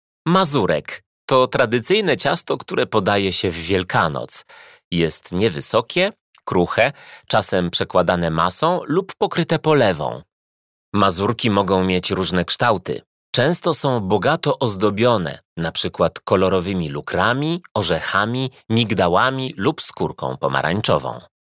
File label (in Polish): mazurek; kodowanie na start